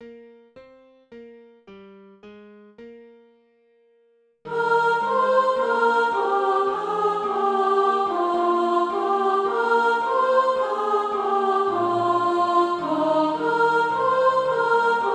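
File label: sop, Practise Music; SATB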